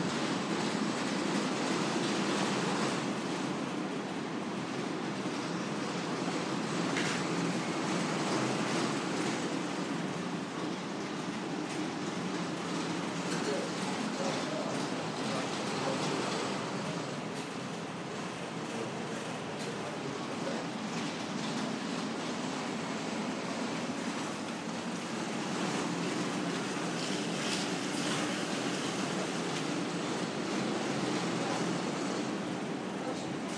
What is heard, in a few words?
very noisy heater; at waitrose portishead